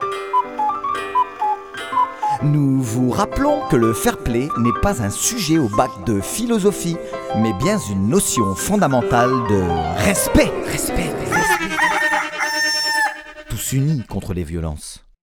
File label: jingle; grande journee de la citoyennete du comite 57 hb